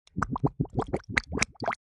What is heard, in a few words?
Cartoon drowning bubbles